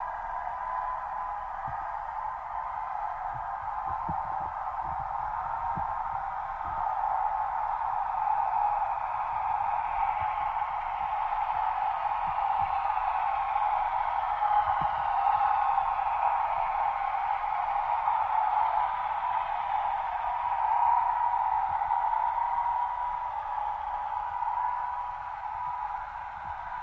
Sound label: Torpedo Jet By, torpedos